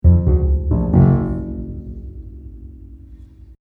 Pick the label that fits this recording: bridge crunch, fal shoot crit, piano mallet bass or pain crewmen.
piano mallet bass